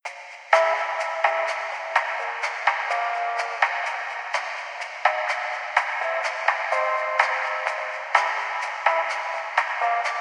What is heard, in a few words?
Title Screen Music (High-Pass Filter)